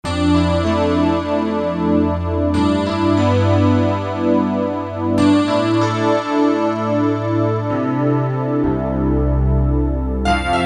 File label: PIANO PAD, Korg DSS-1